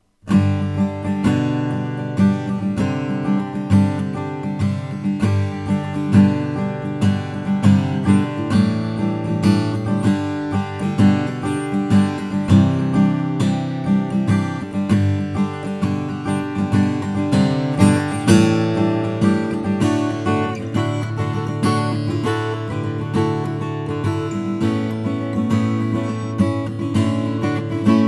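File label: Tippin Crescendo wild Cuban mahogany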